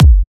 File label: Trance Kicks, Bassdrums